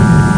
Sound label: Zoom; PC Gamer IT CD 35